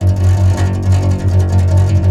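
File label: Contrabass Balalaika, Plucked Strings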